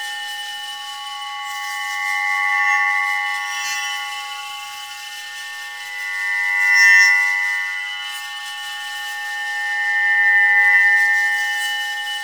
Head Temple Dual Sword Loop; Ambience; Gameplay; Duviri

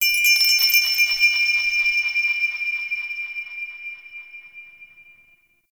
Interior Orb Station Chime; Warframe; Tau; Orbital Station

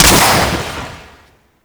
Swarm Missile Launcher Fire; Warframe

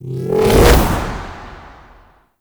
Comet Pellet Launch Whoosh; Gameplay; Warframe; Corpus Railjack; Comet Shredder

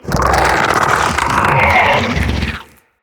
Spider Vocal Chatter; Vocals; Root Spider Mother

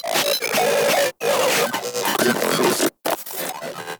Vocal Idle; Moa; Vocal Set D

